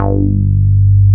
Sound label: SH101; OLD ROLNDS